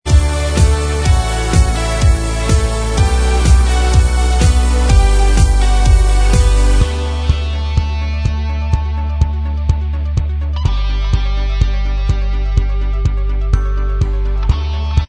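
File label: instrumental track, backing tracks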